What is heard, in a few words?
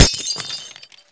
glass, bullets impact; hl2